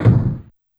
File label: D#2 Door Slam, Yamaha PSR-E323 Sound Effect Kit